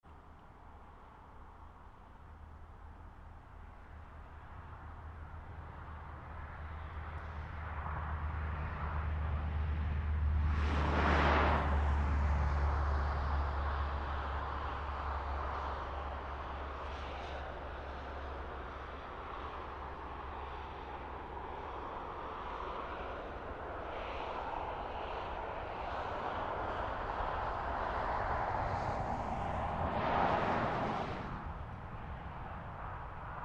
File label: Traffic Country Road 2 Lane Med Far; Traffic and Cars; Big Room Sound